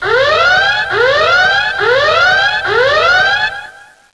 uprising; cstrike; jailassounds

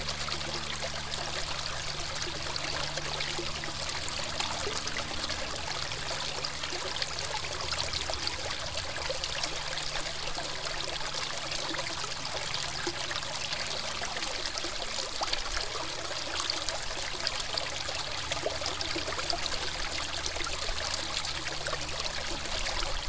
waterfall; rach

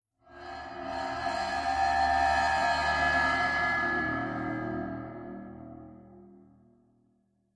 hole hit; cstrike

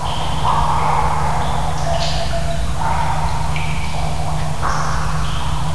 caves; cstrike; desperados

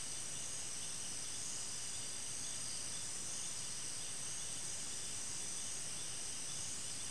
jaguar shoots jungle; cstrike

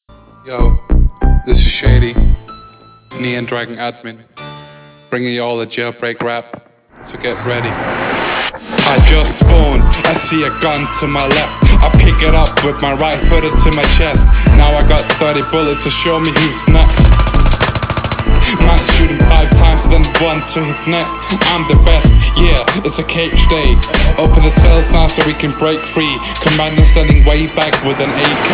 music; jb community

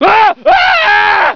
scream, scientist; cstrike

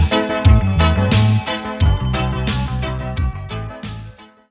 music immunize; jbextreme

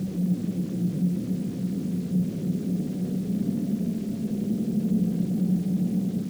tunnel wind loop; cstrike

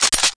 after reload; weapons; janus11; cstrike

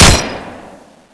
gatling; cstrike

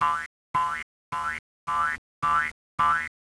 water pistol idle; cstrike

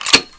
gatling clip out, weapons; cstrike

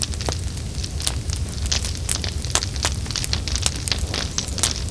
burning; cstrike; solid

